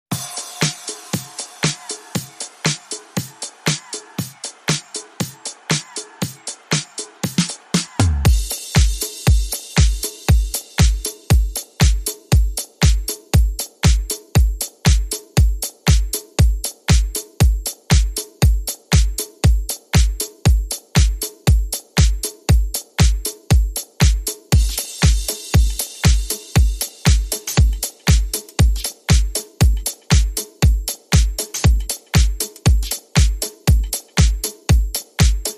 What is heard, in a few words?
Beat